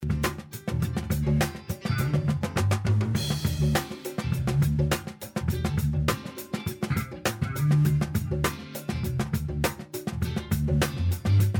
drums only

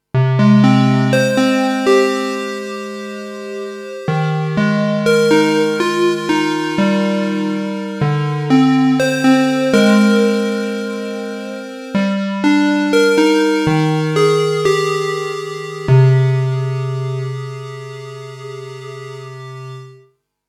Juno 60 Melody CHORUS; LXP-1

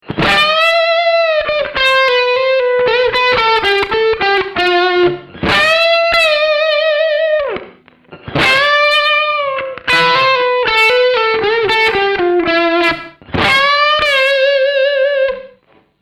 Medium Gain

